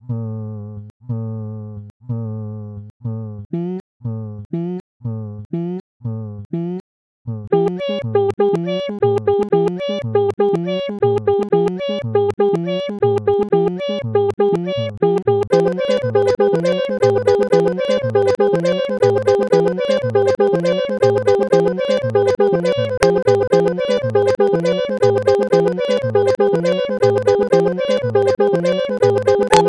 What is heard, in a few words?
samba